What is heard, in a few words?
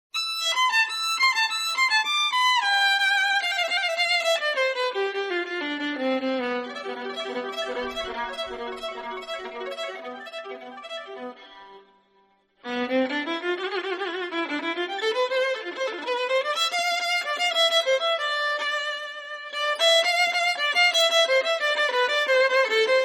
donkey beat